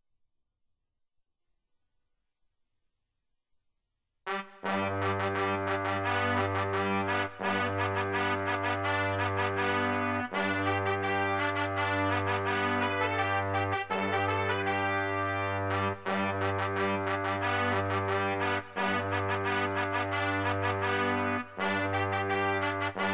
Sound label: brass section melody